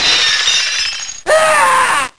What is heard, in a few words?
crush dea